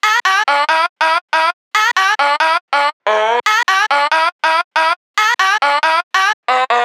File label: 140 Am Hard Melody